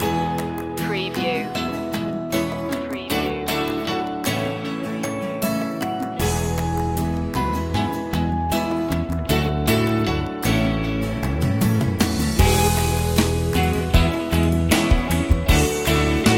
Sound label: promising voyage loop